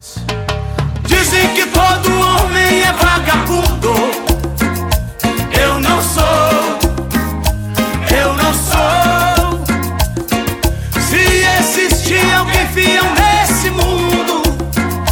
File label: RINGTONE